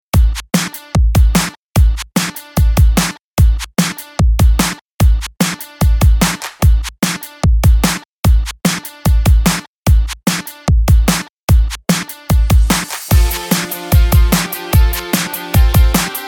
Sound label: bpm 148